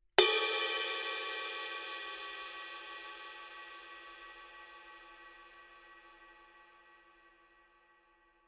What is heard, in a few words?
signature 20 mellow ride bell; paiste